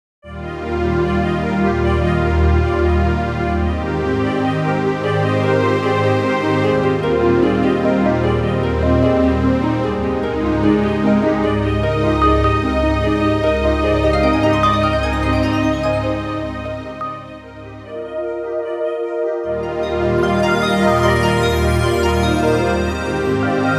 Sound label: Whale Waters (Aquatic Ambience Returns); Donkey Kong Country Returns; 3DS, Wii, Wii U